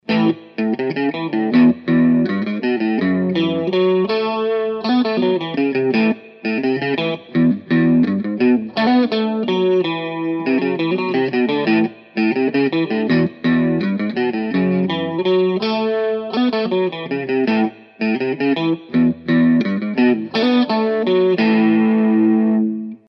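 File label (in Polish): git. elektr., electric guitar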